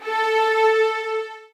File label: SOFT VIOLINS